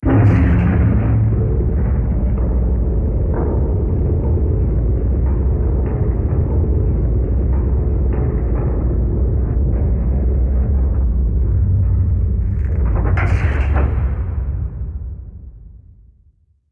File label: outside silo doors open; ambient; launch; Half Life 2; Episode 2